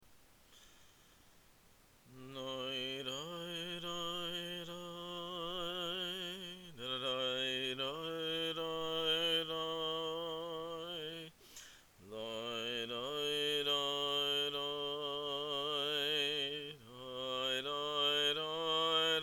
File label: nigun nishmat, Cantor